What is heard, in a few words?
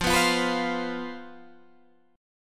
C Major 7th Suspended 4th, F, guitar chord